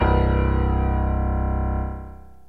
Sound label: Piano Key C